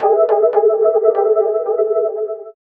electric retrowave, retrowave

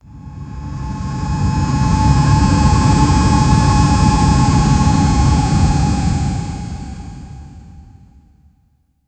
fading air rush

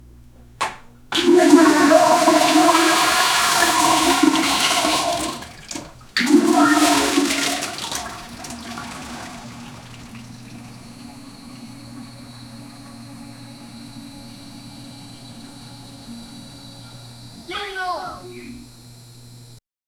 a sound of someone yellin, toilet

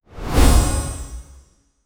disappear vanish magic wh

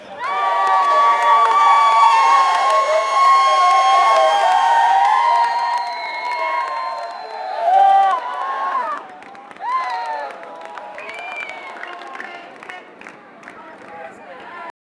circus crowd cheering

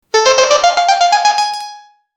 ad sound funny sound; advertising

